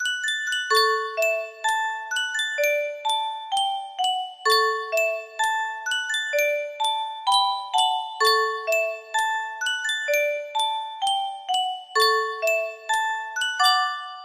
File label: Yunsheng music box, music box melody